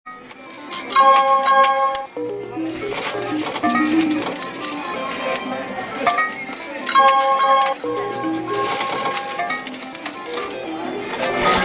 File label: Casino